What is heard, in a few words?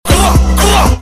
graaaaaah